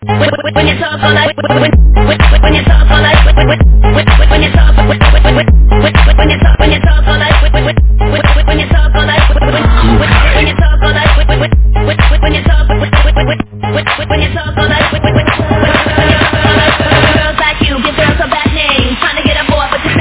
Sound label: CLUB HOUSE